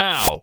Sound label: China Out Sound; Jolly Battle; Android